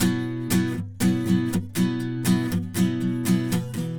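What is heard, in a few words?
Classic Rock Acoustic, Guitars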